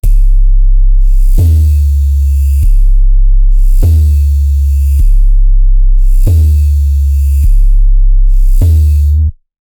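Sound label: bass machine kick dj; electronic music; bass macine kick dj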